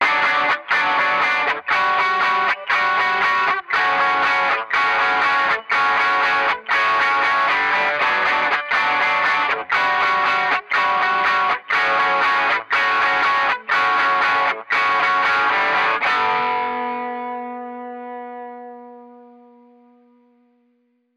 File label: GTR Bm Crunch Progression 120bpm; indie rock; chitarre; indie rock in home studio